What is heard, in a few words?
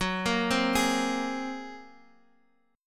Bb 7th Suspended 2nd Sharp 5th Gb